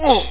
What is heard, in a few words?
UGH; BallBench